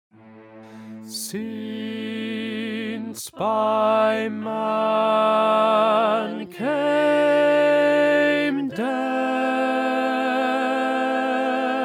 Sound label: Tenor; Handel; Messiah